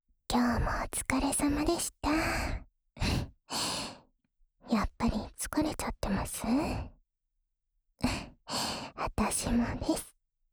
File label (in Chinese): 助眠用 陪睡（右耳）